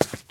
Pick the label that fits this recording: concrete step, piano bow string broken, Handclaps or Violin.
concrete step